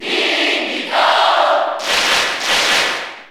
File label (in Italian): Cheer Italian; Diddy Kong; SSBU